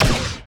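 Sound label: FG SHOTS, future gun; Total Control - Action Cutz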